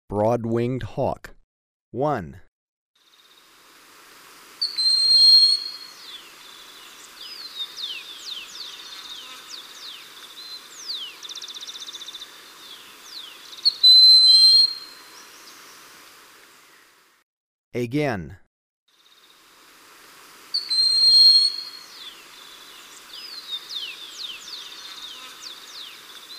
Broad-winged Hawk, Birds of Ecuador; S. America

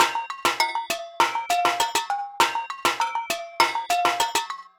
JUNKYARD RHYTHMS

